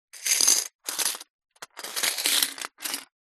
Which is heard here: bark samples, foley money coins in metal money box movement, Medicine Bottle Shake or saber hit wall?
foley money coins in metal money box movement